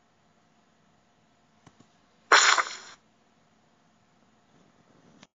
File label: paint splatter; پاشیدن رنگ